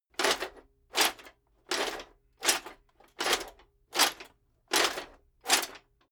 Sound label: metal plate armor movement sequence